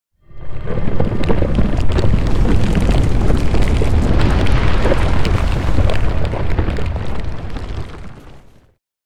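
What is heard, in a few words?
electronic quake dodododo; anemoi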